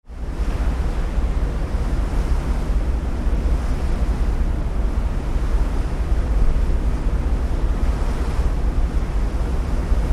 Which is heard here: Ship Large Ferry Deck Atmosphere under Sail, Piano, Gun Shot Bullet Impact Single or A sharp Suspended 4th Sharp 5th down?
Ship Large Ferry Deck Atmosphere under Sail